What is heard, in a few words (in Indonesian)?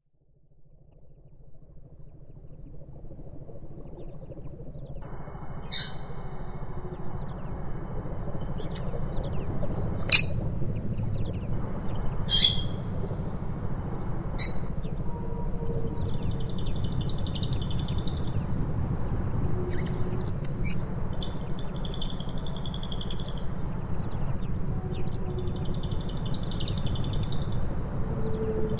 audio terapi lovebird